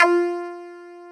F5, Clave; Orchestral; FL Keys

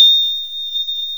ping; JUST FOR FX

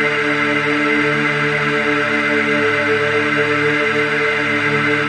PAD Reso Chor

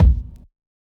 Kicks; Touch Loops; CosmicFunk

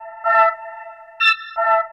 house synth 125 A, keys

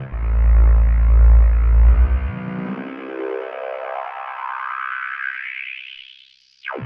TEKNO 140, Techno; Techno2; Sphere

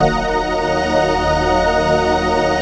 PAD

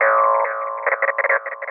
TECHNO EXTRA 140

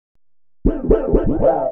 HOUSE FX 125

